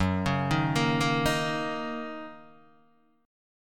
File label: Fsharp 6th down Guitar Open D